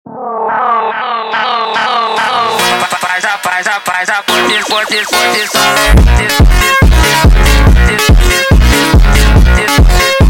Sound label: Montagem sped Up